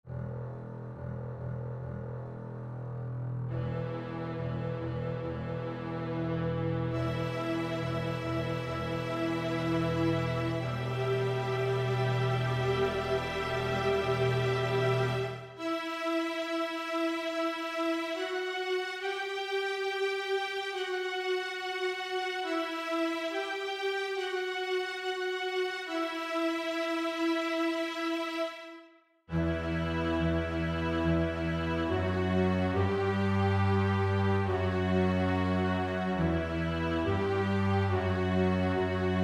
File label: Exploring E Minor